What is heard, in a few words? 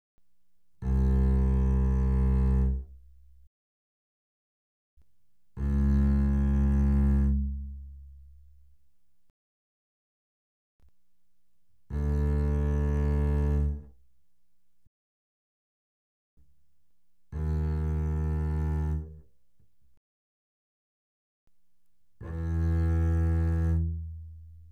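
Bass arco fortissimo sul A C2 B2, doublebass; Strings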